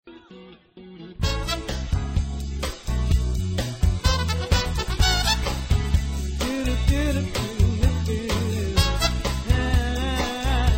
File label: Medley